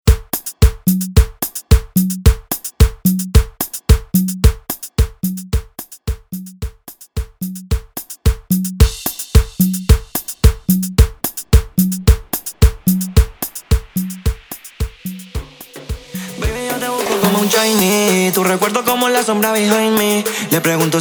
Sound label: Intro Outro 110 BPM; Easykid